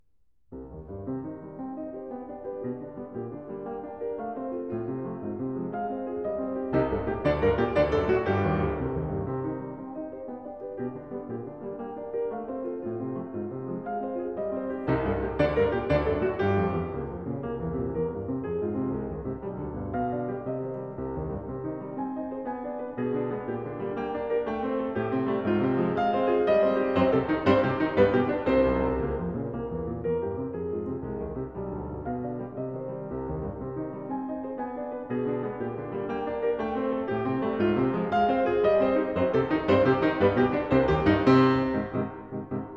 Piano Sonata No. 13 in E-Flat Major, Allegro molto e vivace; Beethoven, The Complete Piano Sonatas